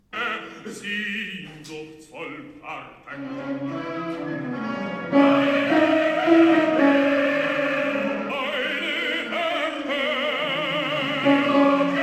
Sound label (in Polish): tenorzy; cardillac